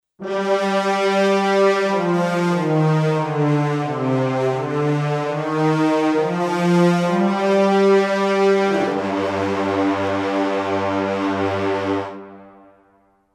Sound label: Brass Ensemble Horns True Legato